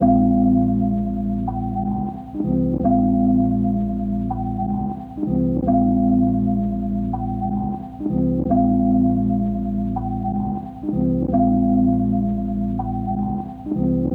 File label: Tape Loop Melody C; Tape Loops; Melodies; Cymatics - Eternity Sample Pack